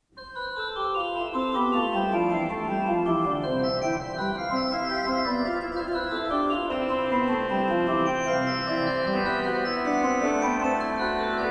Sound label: Variation, ORGAN; BACH; BACH ORGAN WORKS; BWV 769; Canonic Variations on -Vom Himmel hoch